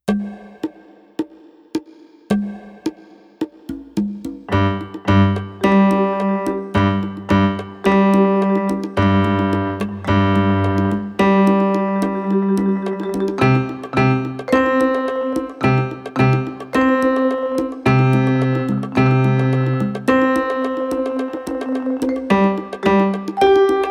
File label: Bongo Drummers Slow